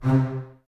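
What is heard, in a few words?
Basses C 3 Staccato; String Ensembles; Miroslav Vitous; 90 s Sample CDs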